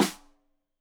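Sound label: Snare Stereo, Drums; Deeply Sampled Drums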